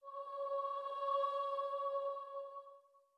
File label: starting note, voice; subito forte